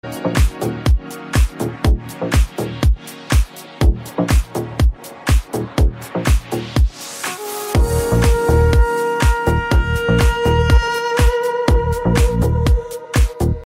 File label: iphone 7 plus extended ringtone